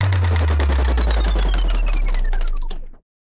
Transition Spin Down Descend; Production Element

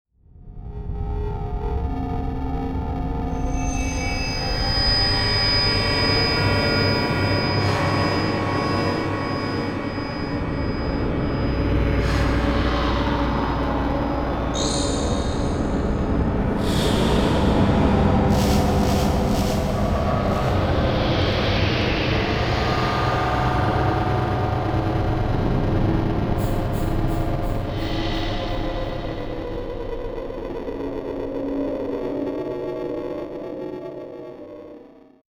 Static Delay; Adobe; Production Element; Imaging

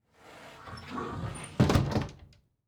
Door Bi-fold Door Close; household

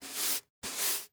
Household Broom Sweeping Cement Floor Twice

